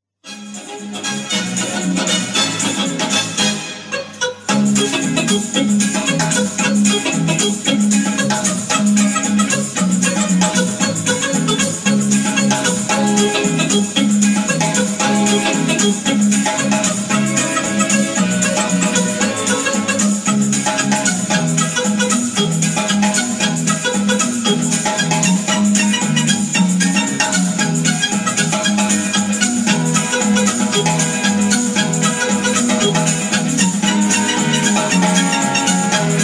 orchestra, samba

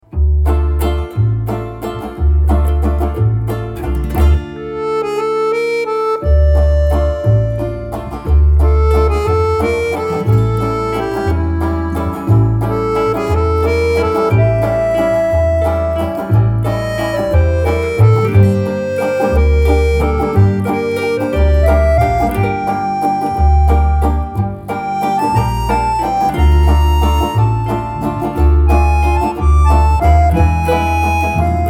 waltz

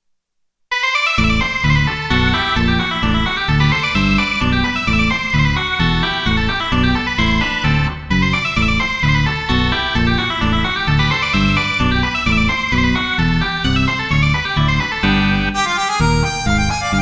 polka

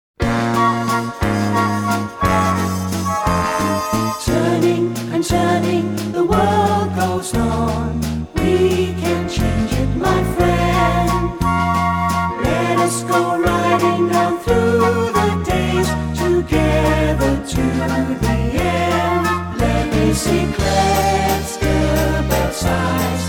waltz

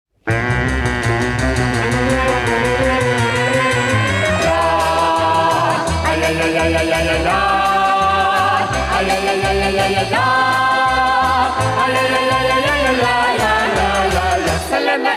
vokalnyy kvartet

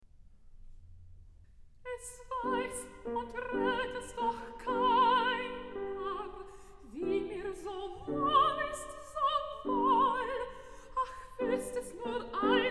songs for voice & piano; Die Stille; Es weiss und rät es doch keiner; Schumann; Liederkreis, Op.39

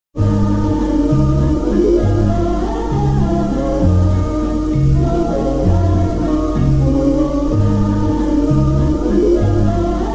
orchestra

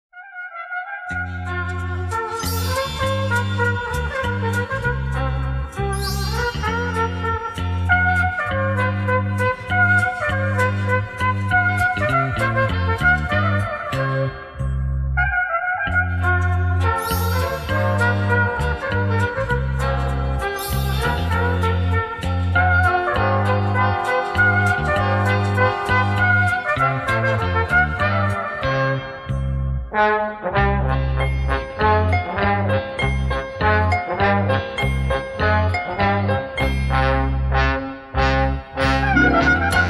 orchestra, menuet

